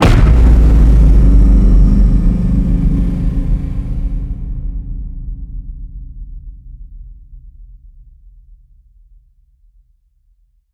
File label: HIT SciFi Low, Cinematic Hits Designed